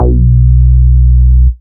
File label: Root B, Ultimate Bass; Vengeance Samples